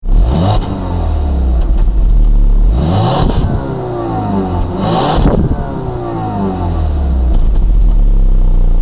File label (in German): Auspuffsound A3 8P 2 0 FSI; Der Klang vom Audi A3 2.0 FSI